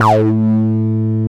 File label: 303 A2; Jack Sparrow; Deep Dubstep Progressions